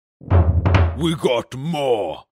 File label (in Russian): zvuk podpiski strim